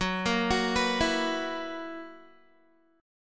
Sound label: Gb 11th, Guitar